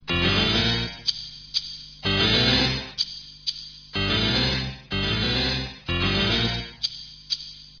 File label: DX Harpsichord